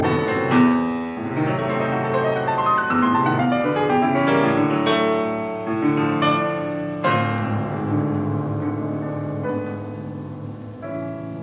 Piano Pieces Intermezzo in A minor Intermezzo in A major; 6 Piano Pieces Op 118 No 1; Wilhelm Backhaus